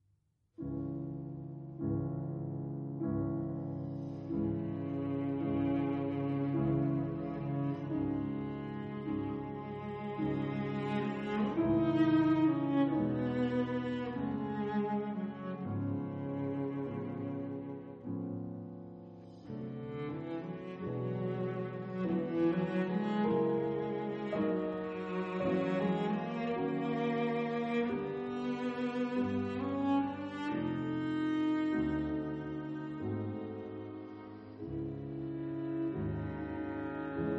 Quintette; Quintette en fa dièse mineur, piano et quatuor à cordes; 3. Allegretto grazioso

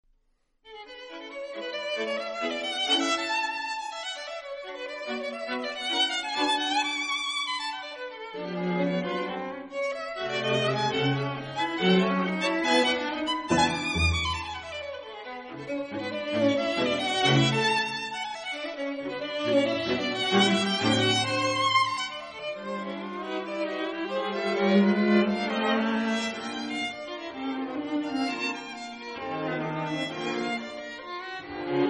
Quatuor la

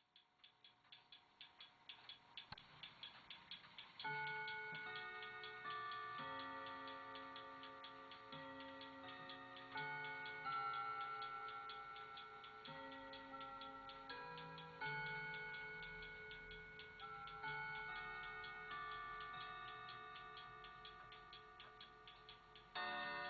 carillon

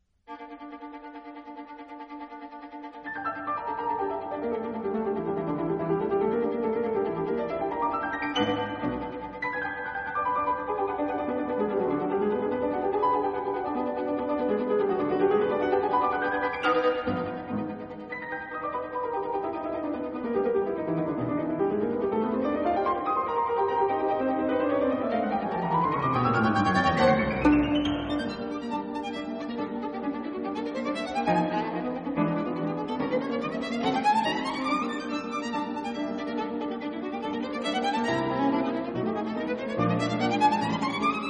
Sonate violon piano

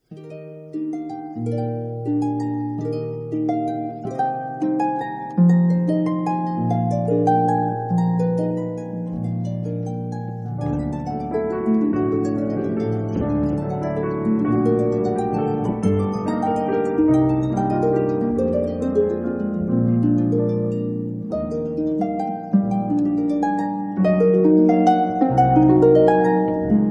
Valse

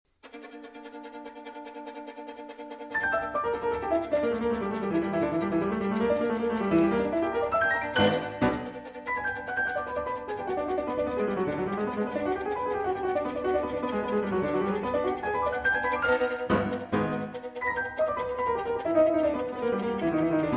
Sonate violon piano; Sonate, violon et piano (Paris : Heugel, 1927); 2. Véloce

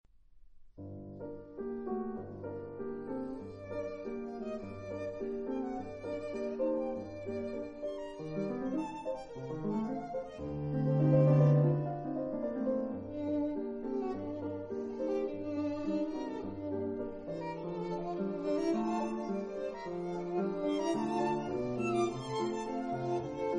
Quatuor piano

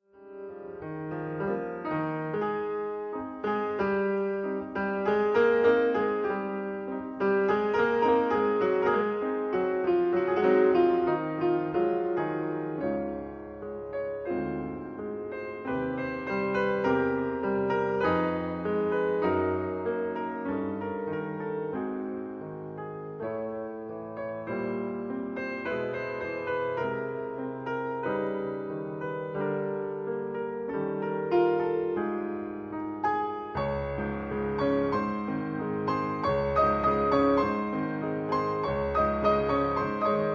berceuse, piano 4 mains

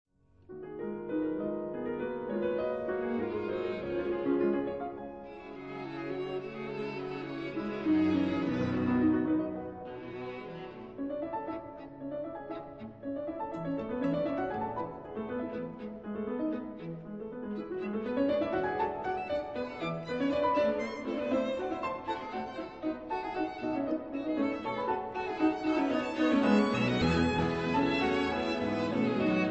Quintette; Quintette en fa dièse mineur, piano et quatuor à cordes; (Paris : Heugel, 1923)

